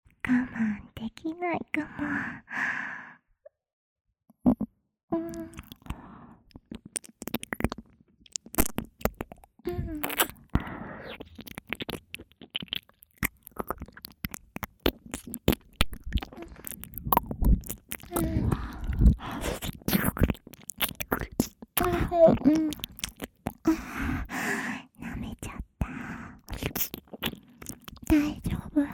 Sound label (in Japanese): エッチな乳首マッサージ+乳首舐め